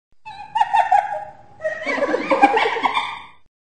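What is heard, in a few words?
scary laugh hyena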